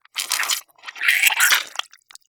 foley gore squish spurt spray squirt; leak blood

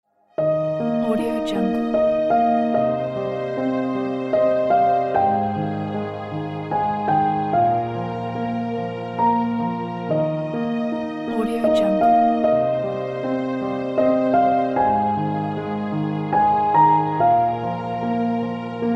peaceful piano; soundrose

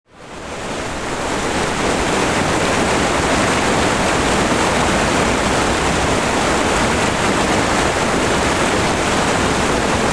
big mountain river

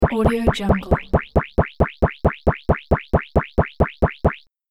game eat or replenish health

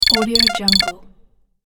triple video game button

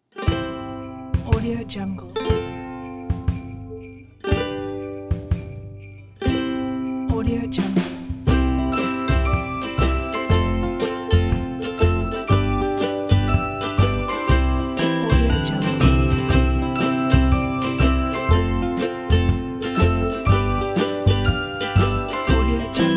christmas ukulele

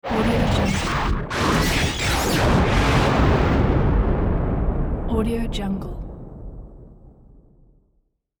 energy collision; sound ideas

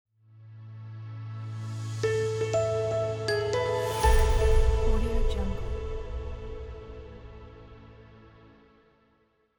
calm logo

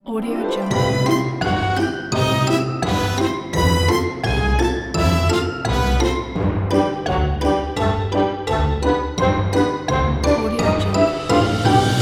for cartoon

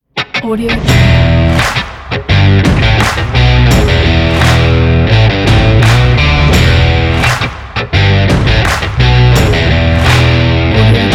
stomp rock logo

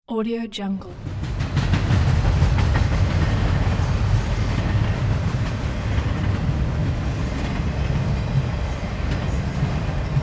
train pass by

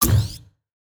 puzzle game upgrade big

